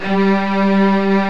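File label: arcato string; OMI - Universe of Sounds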